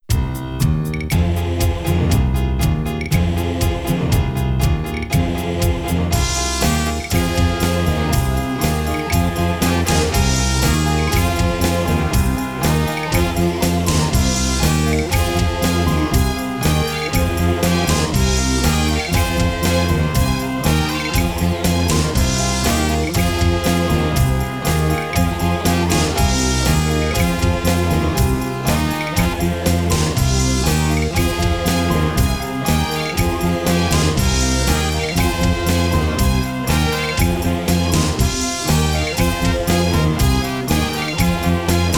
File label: Ball Over, pinball; pro pinball timeshock; ms-dos